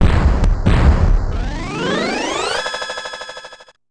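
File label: Arcade Explosion Glissando Up Pan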